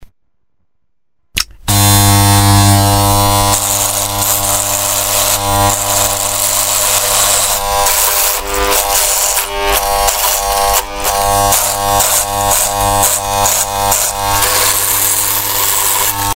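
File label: mobile ringtone coffee grinder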